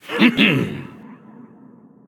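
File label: non verbal yay, voice; cernunnos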